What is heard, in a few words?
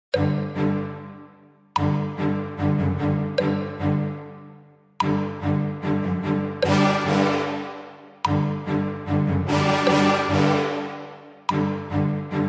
In Game Music (90 Second Countdown); Kahoot